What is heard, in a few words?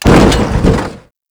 Fall, Impacts; Warframe; Grineer; Foley